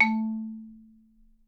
マリンバ; 体鳴楽器; 打奏

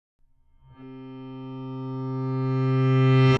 ピアノ時間逆転音 C3; 減衰音

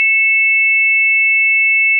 正弦波（定常）2本, 仮想ピッチ; 音の高さ

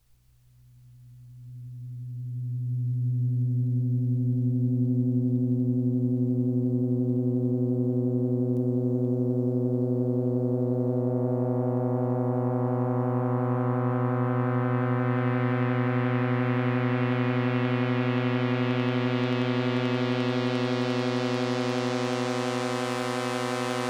ミニ・モーグ, 電鳴楽器; モーグの典型的な音

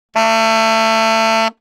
アルトサックス時間逆転音 A3; 持続音

